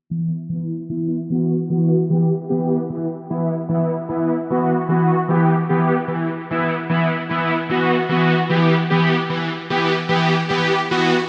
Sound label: OB Synthy; vintage synth roland srx keyboards